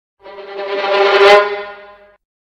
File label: suspense violin